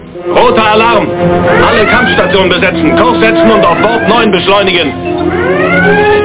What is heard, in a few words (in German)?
roter alarm; Enterprise